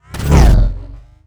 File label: wooshes transform sweetener; Whooshes